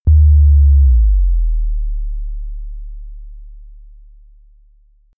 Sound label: bass drop short